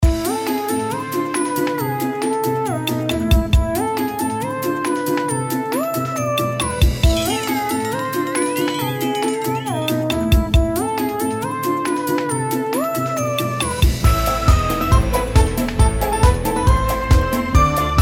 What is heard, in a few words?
Raid; Mist Train Girls